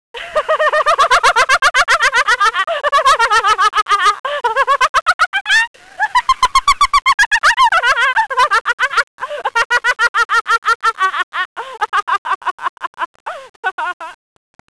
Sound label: laugh